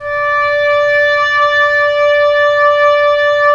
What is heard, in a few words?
OB, Oboe; Roland - LA Composer vol. 4